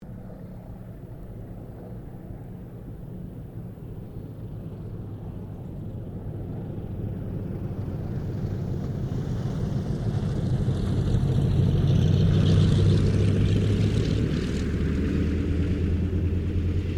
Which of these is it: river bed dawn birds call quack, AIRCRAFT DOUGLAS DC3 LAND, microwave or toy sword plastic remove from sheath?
AIRCRAFT DOUGLAS DC3 LAND